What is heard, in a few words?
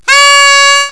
ROAR; Reflux